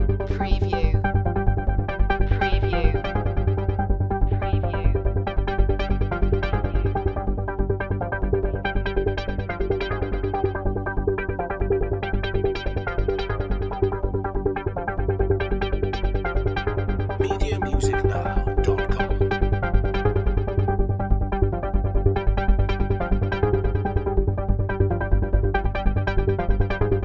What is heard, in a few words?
Techno-Trance Synth Arpeggio Loop JX8P in G 142 BPM